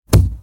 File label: knocking a wall; attack